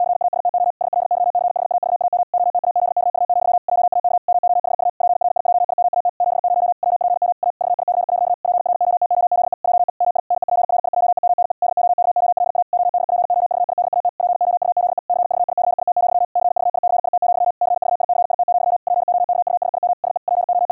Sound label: 80wpm, qrq; 70wpm